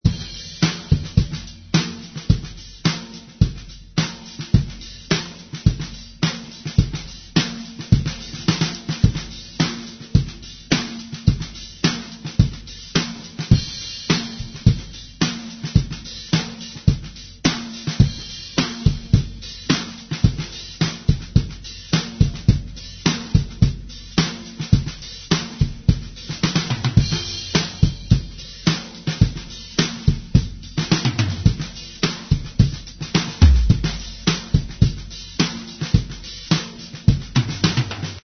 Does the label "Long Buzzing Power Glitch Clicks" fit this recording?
No